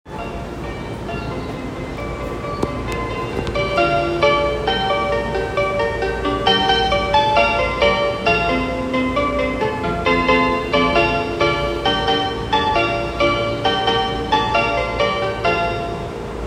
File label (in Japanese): 京急本線平和島南接近